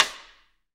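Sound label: snare drum, Real Kit; Real-Kit s12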